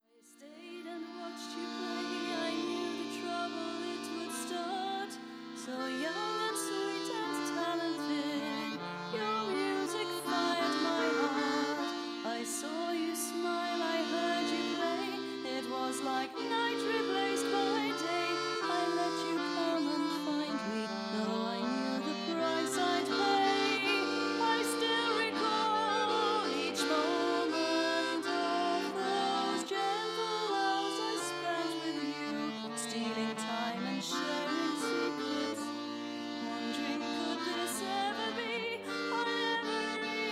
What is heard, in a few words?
accordion